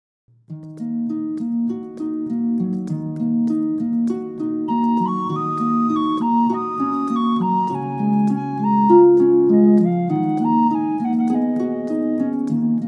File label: Irish Atmosphere